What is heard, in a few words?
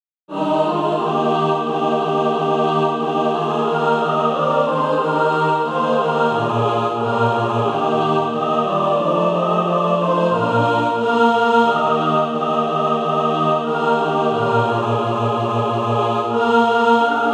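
mixed, SATB, Practise Music; Crystal Songs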